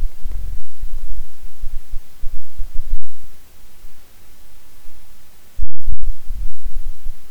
audio sample, recording through external microphone on a 4 ring headset